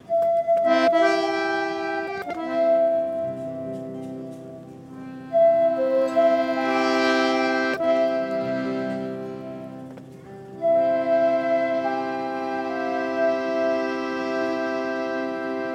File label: for pan flute